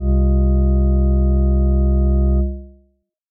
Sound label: typical Chord; procedural harmony; chords like this with many 7th between voices. They sound slightly odd